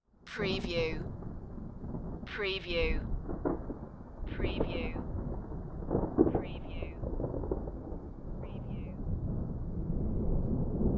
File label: Weather Thunder Crack Roll Rumble